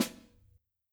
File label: Snr, drum